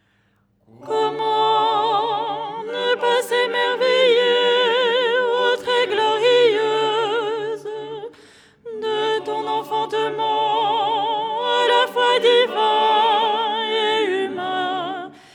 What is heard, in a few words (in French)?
soprano; ton 3